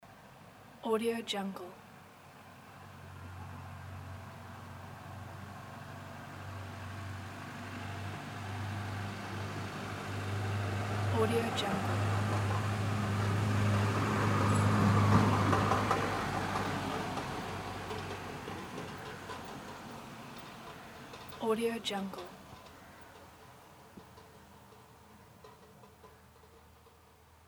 car passes by; audiomechanica2

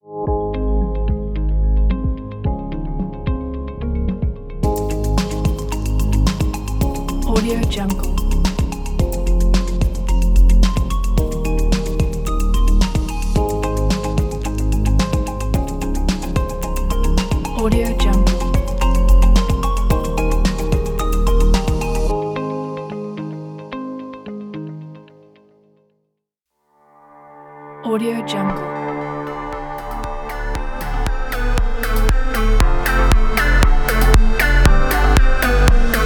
technology corporate ident pack

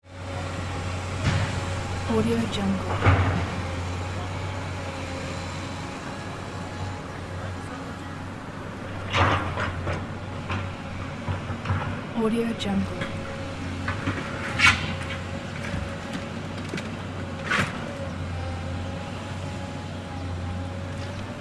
city construction site

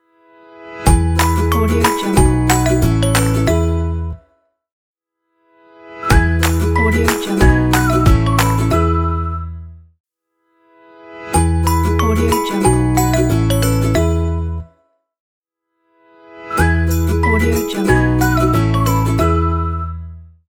happy logo; loka music

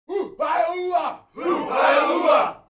human crowd native american shout; smartsoundfx

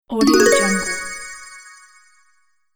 record bonus

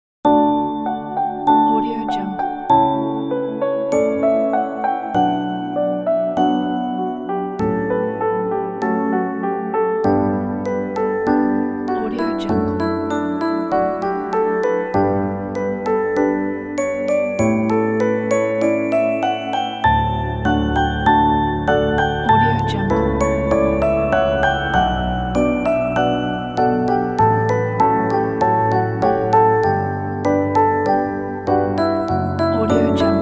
pachelbel in music box

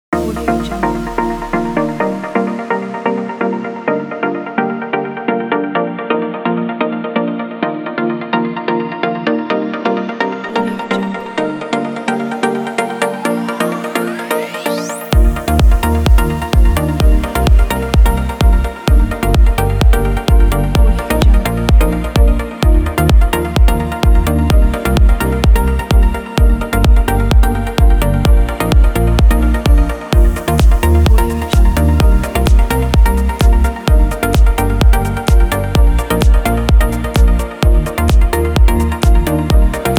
house

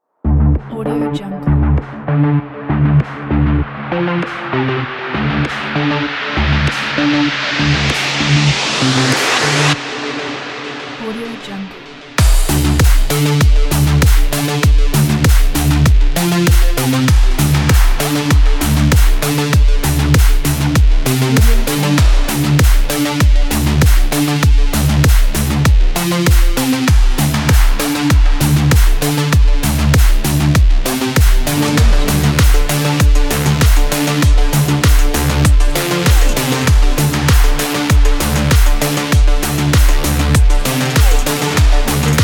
sport energy

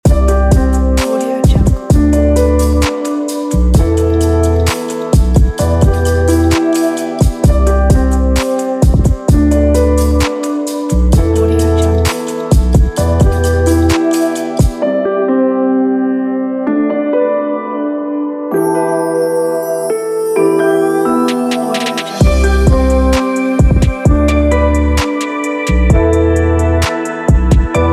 fly high

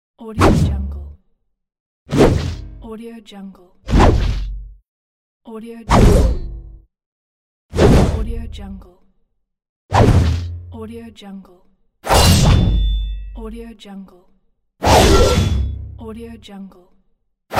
axe swing hit

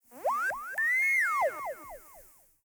electronic beeps alien birds chirps; prosoundfx

